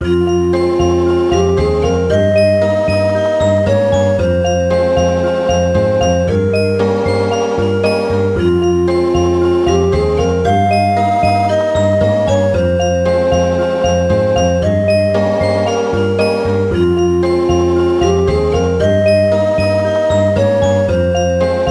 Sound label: BGM; Londonian Gothics Meikyuu no Lolita